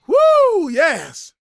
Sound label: SHOUTS, Gospel, PREACHER; Spectrasonics; Vocal Planet Vol.1 Gospel